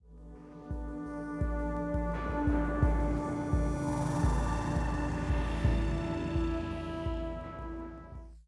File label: Military High 85bpm; Legends of Pegasus; windows gamerip 2012